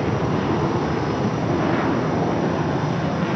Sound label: airplane jet idle; Hearts of Iron IV Death or Dishonor